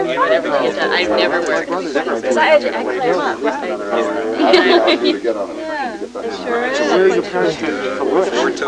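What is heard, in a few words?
background music; Virtual Graceland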